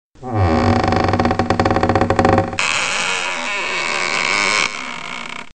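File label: door creak; sound-fx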